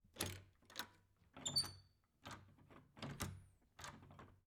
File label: Door Handle Squeak Doorhandle Doorknob